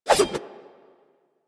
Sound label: Nikana Idle Swing Double A; Revenant